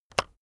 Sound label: keyboard external enter release heavy